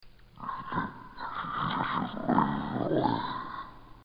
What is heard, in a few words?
zombie; dod